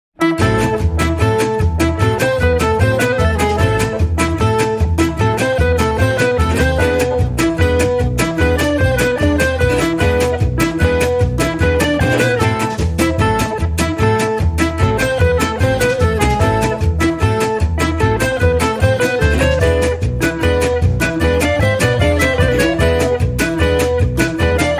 Guitar

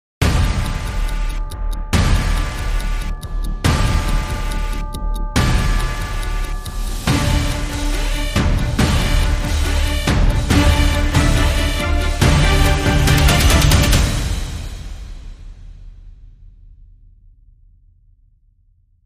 Dramatic Sting Three, Less Cymbals

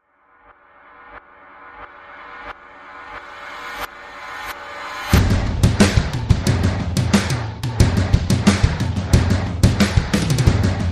Drums Kit From Hell

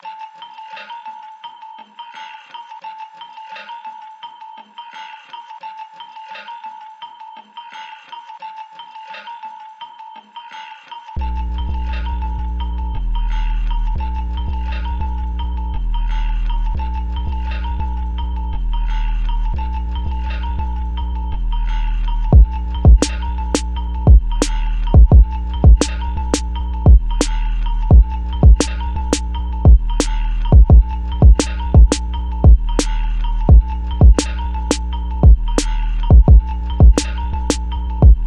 Bells

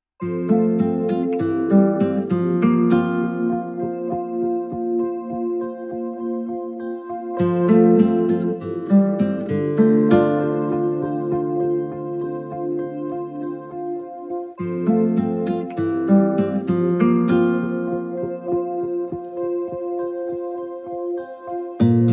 No Strings

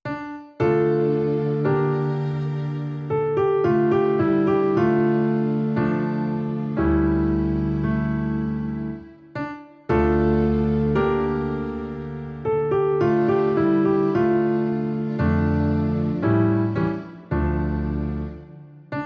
Piano Theme; 60sec

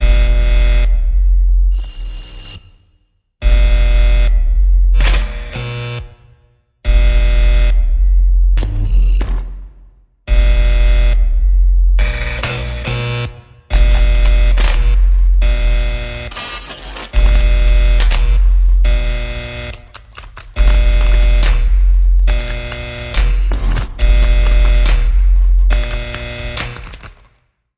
Dark Fallout Warning Call

